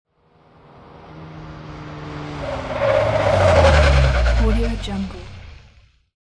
taxicab screeches to a halt; hollywoodedge

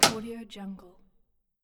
small metal hit; stormwaveaudio

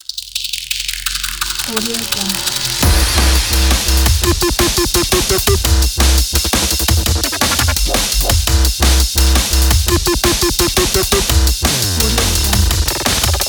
dnb wobble ident

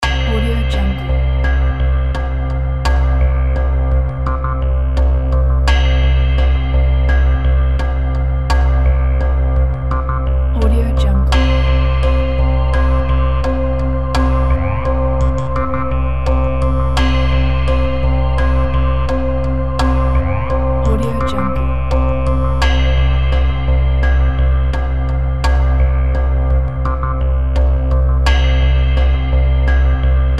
mystery game background loop

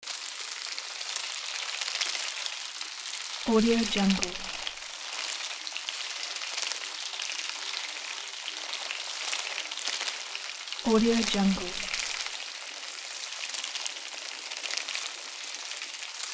insects

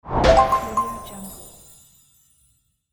bonus sound

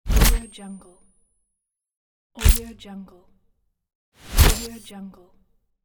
camera flashes

